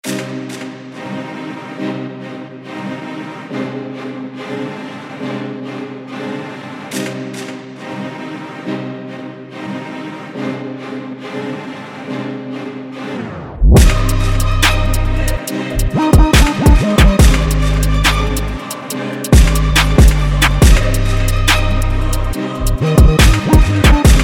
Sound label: quartet